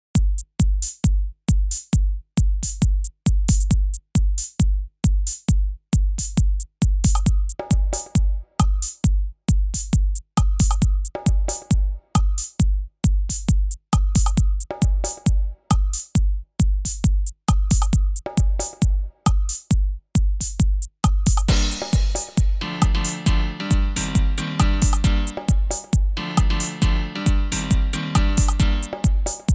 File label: Xmas Piano House